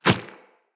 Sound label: Inf Small Walk Default, Footsteps; Infested